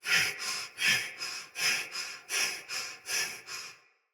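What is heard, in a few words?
Breath Soldier Mace Agent Female; Battle; Sinecure Melee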